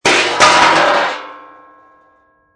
Metal Crash On Cement Heavy, Impact, Metal Crash On Cement, Large Heavy Metal Object Thrown Onto Cement Surface